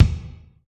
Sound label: drum